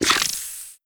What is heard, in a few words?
scared, headcrab; creatures; vox; headcrab black